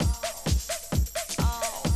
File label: 130, Classic DrumLoops